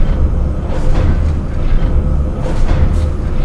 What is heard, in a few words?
machinery